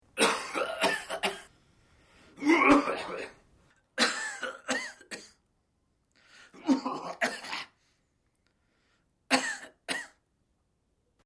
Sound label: Coughing Male; Clearing Throat; Human